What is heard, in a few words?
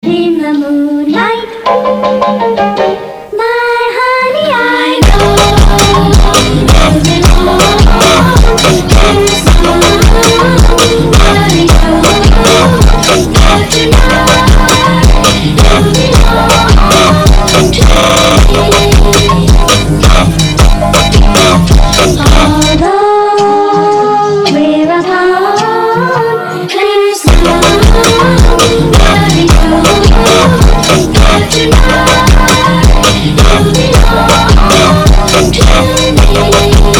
FUNK